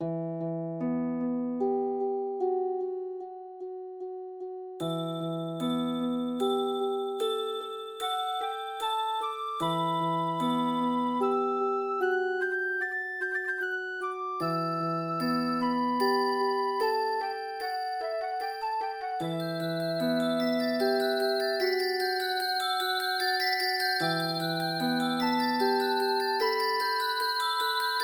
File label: Deepest Woods; Tales of Symphonia; GameCube